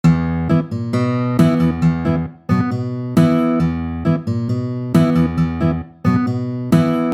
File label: bossa nova groove